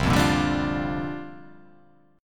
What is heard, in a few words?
C 9th Suspended 4th C down